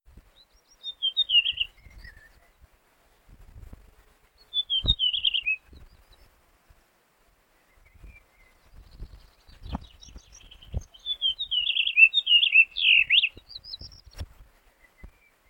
Steinroetel; Oberallgaeu; Kilian Weixler

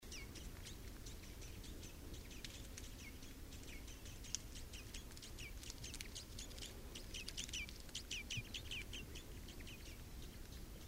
Fichtenkreuzschnabel; Ruselgebiet; Susanne Rieck

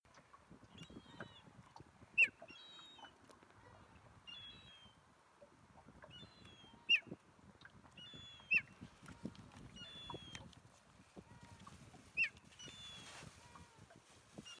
Spatelraubmoewe

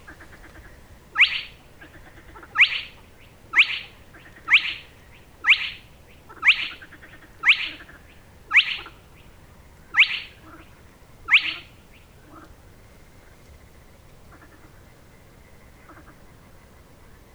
Tuepfelsumpfhuhn; Ammersee Sued; Ingo Weiss

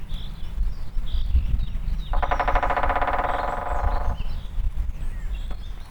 Weissrueckenspecht; Murnauer Moos; Christian Haass

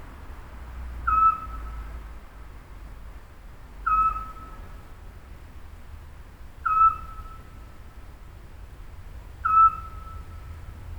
Zwergohreule; Pfaffenhofen PAF; Daniel Honold

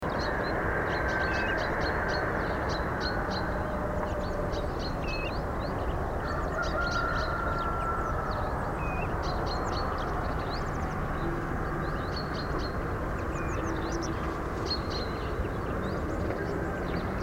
Sandregenpfeifer; Schaumburg bei Landshut BY

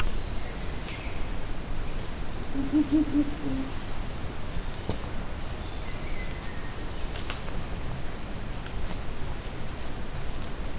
Habichtskauz, Spiegelau Bayerischer Wald; Michle Peron

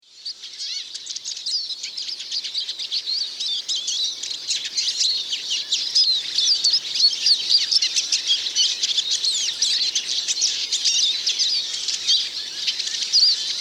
Erlenzeisig; Obelfing LA; Michle Pron

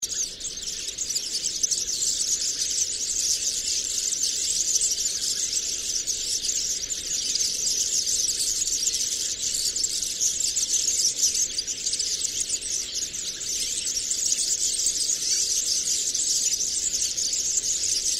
Girlitz; Binsham LL; Susanne Rieck

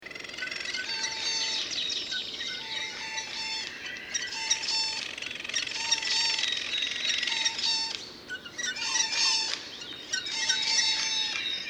Eichelhaeher; Landshut LL; Michle Pron

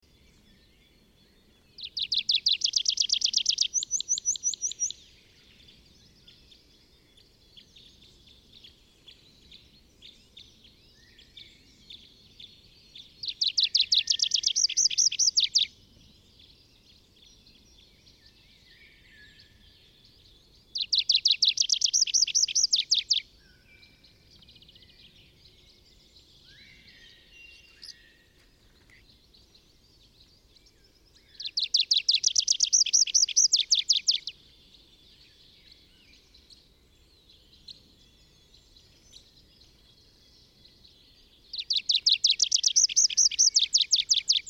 Baumpieper; Bayerischer Wald REG; Susanne Rieck

